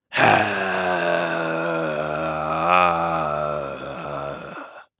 Horror Scary Undead Groan

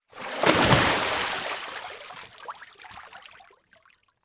Liquid Water Water Splash Hands Big Splash

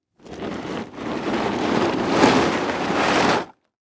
Impact Plastic Drag On Rock Salt Long

